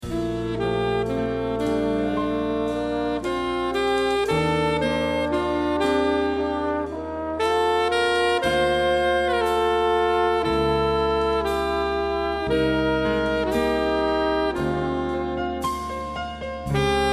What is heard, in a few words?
jazz instrumental